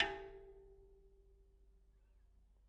Bicycle Spoke

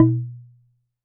Wooden Bar Strike

